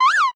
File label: slide; Cookie Run